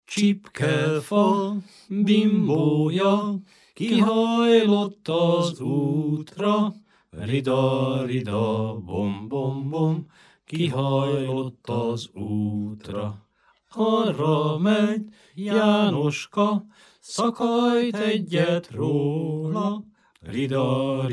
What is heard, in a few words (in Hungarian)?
nepdal; csipkefa bimbója